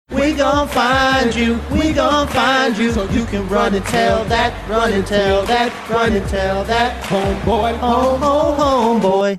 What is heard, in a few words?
singing along to song